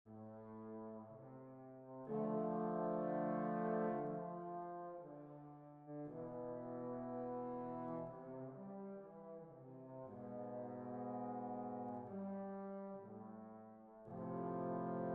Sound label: trombone; Red Background Music; RED: A World of Colors